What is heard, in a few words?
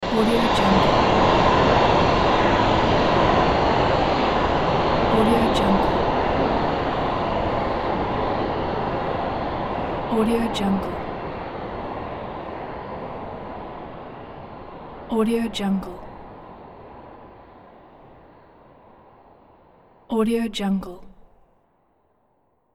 transition vacuum